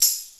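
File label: TAMBOURINE, MPC-60 DRUMS; Greytsounds VOL-1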